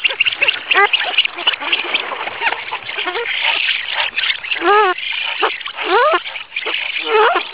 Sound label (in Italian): spatola